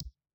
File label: Enter Table; Game rip; MiSide